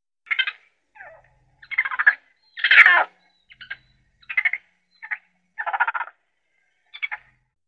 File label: Raccoon Chitters With Squeaks In Background; Medium Perspective.